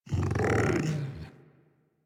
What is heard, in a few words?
Tame Interact Sleep Rollover Groan; Enemies; Warframe